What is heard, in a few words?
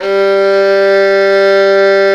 violin G1, Balkanish Traditions